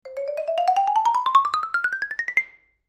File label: Xylophone Lng Ascend, mallets xylophone